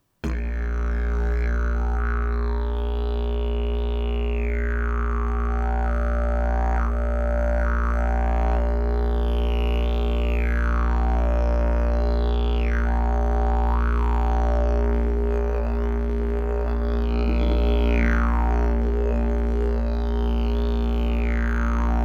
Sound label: didge